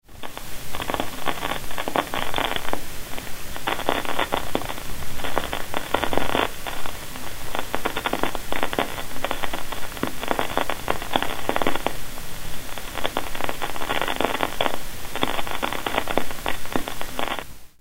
Unwetter static noise

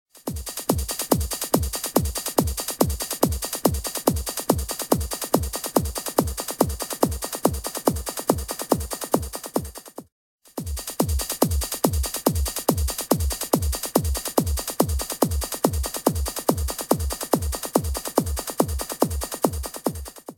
bass

